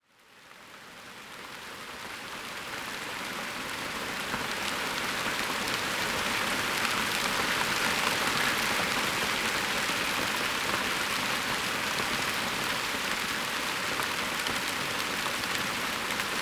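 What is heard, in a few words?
Rain for falling asleep without music, Rain sounds; Regen zum Einschlafen ohne Musik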